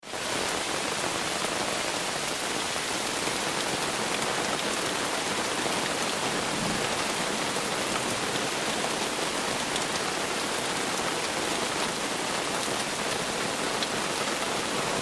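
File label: Rain Inside Tent Heavy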